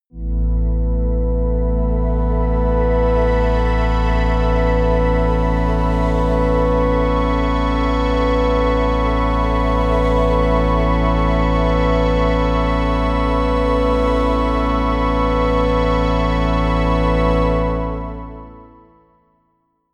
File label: promo pad